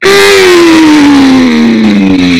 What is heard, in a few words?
mmmmmmmmmmmmmmmmmmm